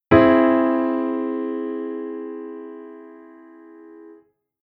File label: Chord Aug